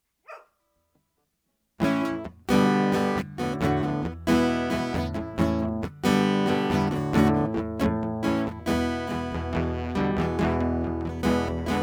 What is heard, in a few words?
Guitar